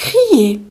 scream; gcompris-data

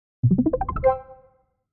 task Completed; rolisteam

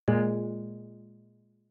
List End; KDE; Marble

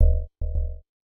hit wall; kollision; games

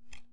card down; lskat; games

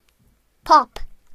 pop; gcompris-data

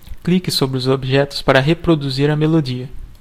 melody; gcompris-data

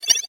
pill; kapman; games

